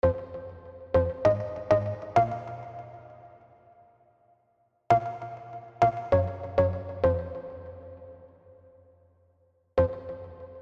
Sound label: Groove 197 BPM F minor